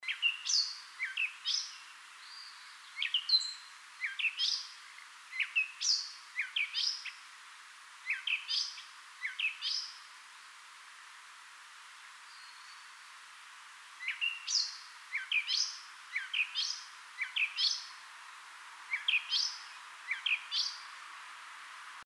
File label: Grey Catbird Dumetella carolinensis